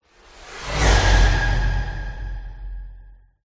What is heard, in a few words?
whoosh; orlando lanzini